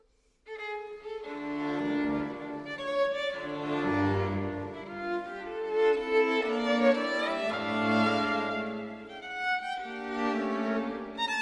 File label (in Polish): Kwartet smyczkowy d moll Allegro agitato; Moniuszko